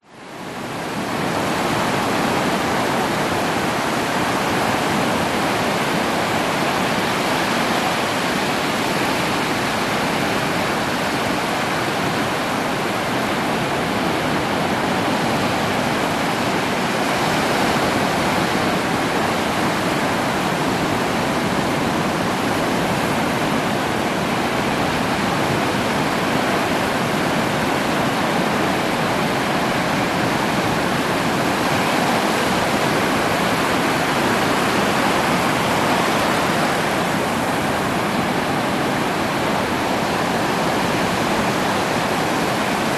nature ocean sub tropical surf outgoing tide ambience; zapsplat